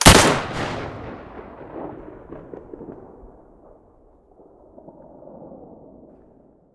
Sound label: mosin muzzle stereo, weapons